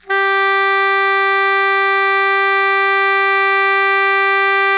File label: SOPRANO G 3, Saxophones; MF; Greytsounds; Philip Wolfe David Crozier's Saxophones